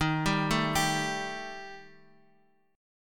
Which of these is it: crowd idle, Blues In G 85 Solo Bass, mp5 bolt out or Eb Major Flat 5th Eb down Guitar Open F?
Eb Major Flat 5th Eb down Guitar Open F